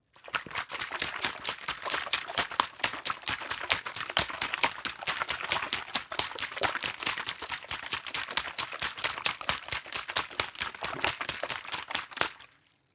bottle water shake long; gastronomie; berufe

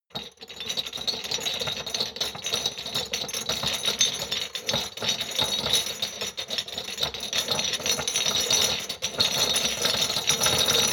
shot glasses rattle medium; berufe; gastronomie; soundpool

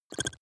click stutter soft; maschinen; signale; soundpool

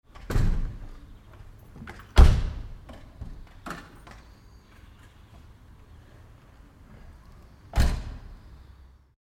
car door open close

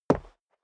step dress boots concrete single left; Footsteps; schritte

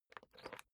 briefcase plastic latch lock key turn; industrie wirtschaft; bueroarbeit

